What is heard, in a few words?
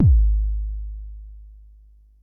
Bassdrum, Jomox Xbase09